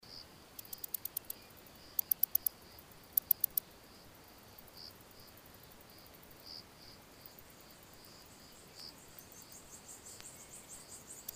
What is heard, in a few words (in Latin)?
Barbitistes vicetinus; BIOAC ORTH